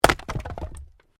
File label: Wood Chopping Axe